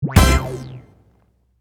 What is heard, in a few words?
small win; netent